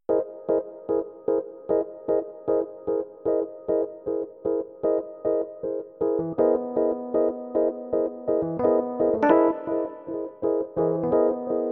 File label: piano; improv: E. Piano